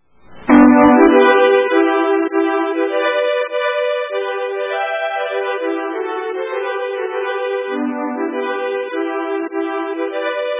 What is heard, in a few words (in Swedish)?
Polska